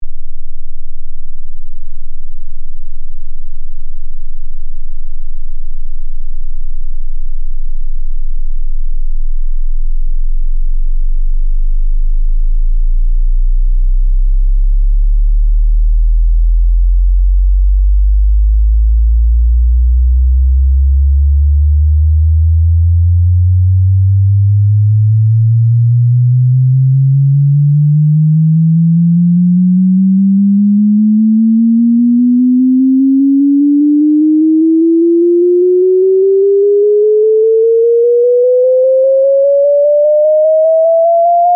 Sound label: Sweep -5dB; test tones